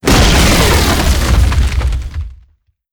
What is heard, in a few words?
tower destruction; building; damage; radiant